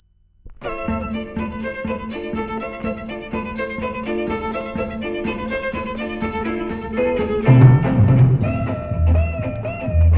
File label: gtr